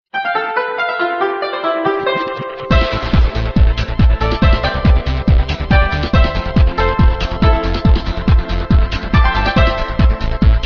Nokia Chinese

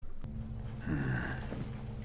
"Hmm"

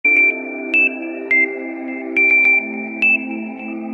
Crystal Drop short

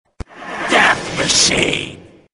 Death Machine

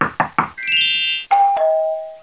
Yahoo Msgr

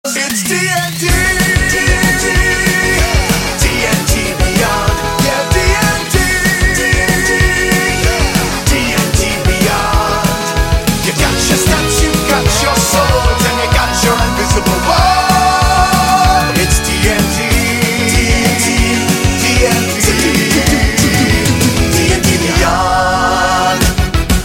chorus; DnD beyond

